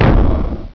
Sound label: EXPLOSION; Macworld (1998-01); Games World; Gubble Demo